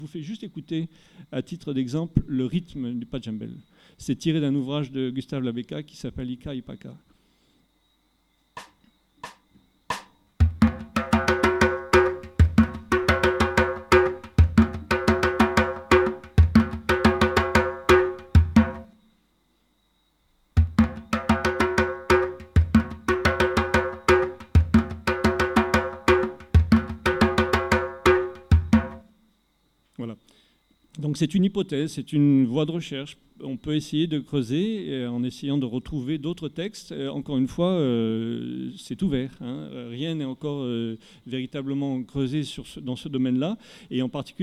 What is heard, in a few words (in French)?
illustration musicale Padjenbel; Conférence audio Laméca